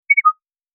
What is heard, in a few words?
pipo